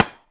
Hit Spike; Civ4 warlords

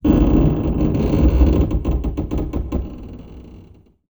Amb Constructions; Red Orchestra Ostfront 41-45

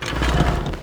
foley move

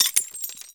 glass shards; Call of Duty Modern Warfare; physics

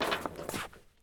stop metal; Escape from Tarkov

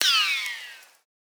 bullet ricochet; Rust

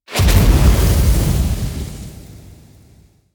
damage vehicle; World Of Warships

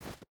footstep barefoot snow run; Rust

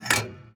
gun reload; World of Tanks

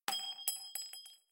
shells 20mm bolt

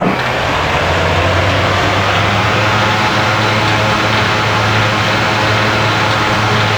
Aircrafts FW-190D9 Gear Down; DCS

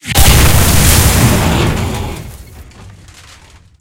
tank hit heat big; tanks; War Thunder

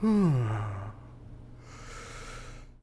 Yawn Vox; Civ4 warlords

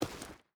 footstep rubber boots stones walk; Rust

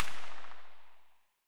kedr loop silenced distant tail; Escape from Tarkov

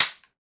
grenade launcher no ammo

